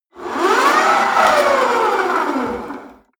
rope trainer pull sequence fast short; sport freizeit; fitnessstudio

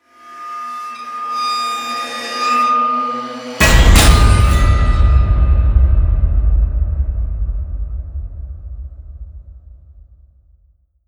hit metal

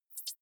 hover high, signale

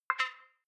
click whistle up, signale

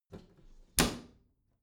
bathroom cabinet close heavy

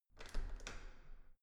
entrance door handle use normal

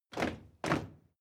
step crowd march loud stop; schritte

